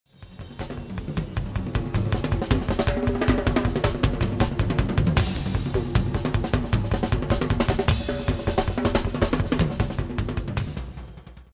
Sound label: floating snare